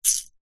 food peppercorn black in plastic mill shake single; zapsplat